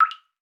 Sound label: Liquid Water Drop Single